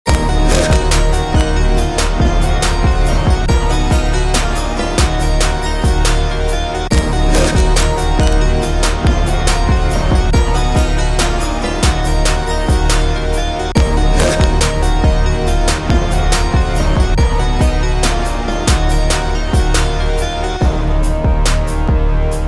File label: Sick trap